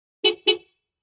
1999 Camry Car Horn; 1999 toyota camry

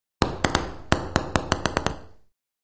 Ping Pong Ball Drop

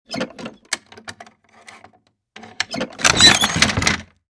Metal Latch Clicks, latches & bolts

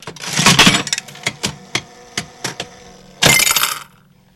Slot Machine Lever Ru

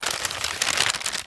Paper Bag Crumple; paper mvmt

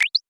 Interface Beep, Sci-Fi Space; Interface Beep 5

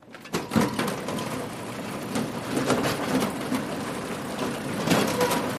Hay Elevator Runnin

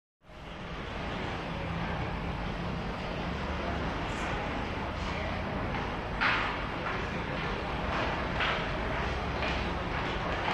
Train Stn Foreign Amb, train station

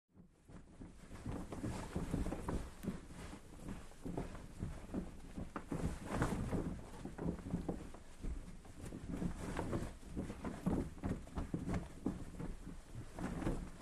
Small Heavy Canvas Sa, flap, sail

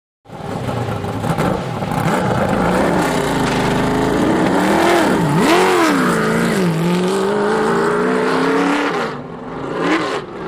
Sports Car Away Idle

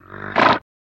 Horse Snort Generic

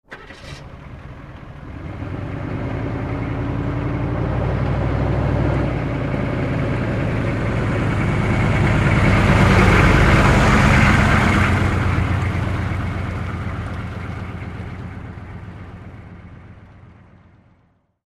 Humvee: Distant Start, By And Slow Away.

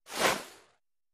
Arrow Sizzle Bys Medi; bow & arrow bys

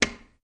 Light Switch Wall Switch; switches

